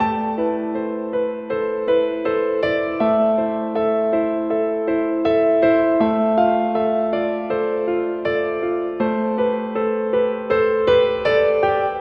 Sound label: Piano; Сэмпл пианино (Movie Score): Piano I; 80BPM; film music; Keys; Клавишные